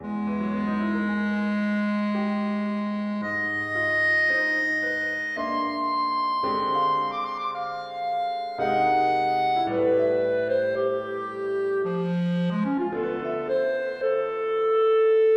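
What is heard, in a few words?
Clarinet and Piano Repose Larghetto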